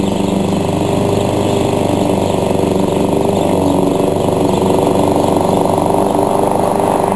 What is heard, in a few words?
CUT LAWN; ENVIRON